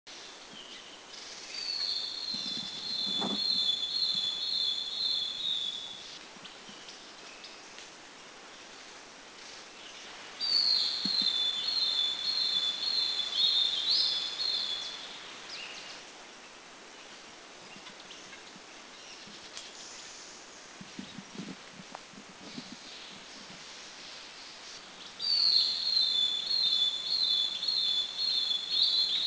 Long-tailed Broadbill; Laos

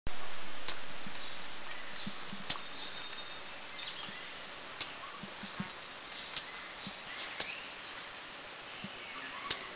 Fire-breasted Flowerpecker; Mishmi; India

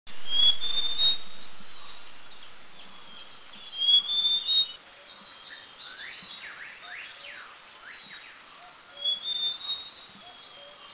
Large Niltava; Mishmi; India

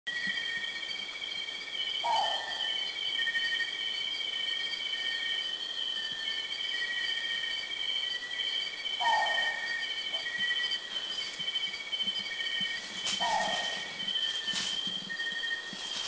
Philippine Frogmouth; Kitanglad; incredibly obliging Philippine Frogmouth

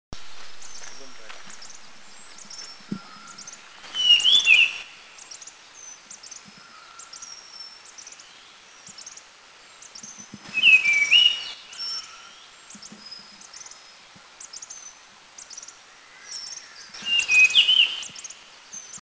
Blue-fronted Robin, Eaglenest, India; song

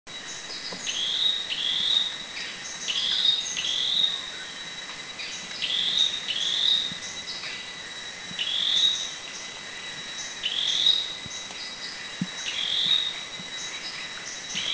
Dark-necked Tailorbird, Thailand

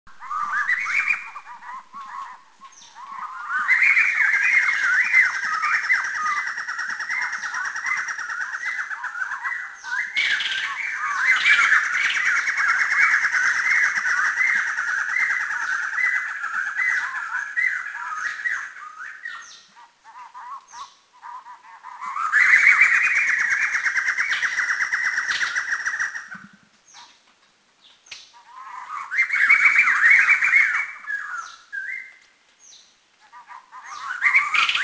White-necked, Thailand; Laughingthrush; Doi Lang; Birding2asia; Stijn De Win

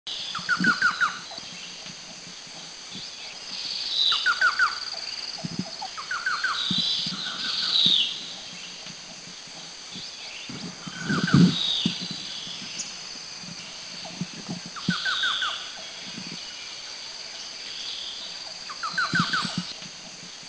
Orange-breasted Trogon; Thailand; Krung Ching

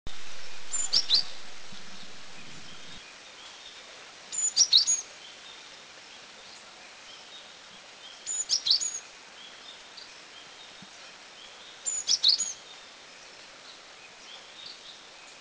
Great Tit Doi Chiang Dao, Thailand; Cinereous Tit

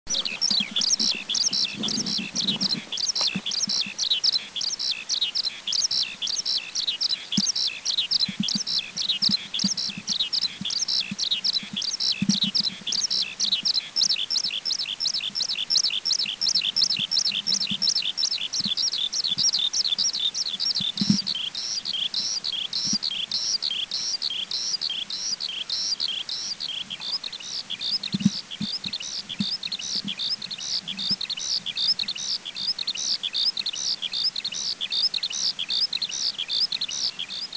Oriental Skylark; Poyang Hu; China

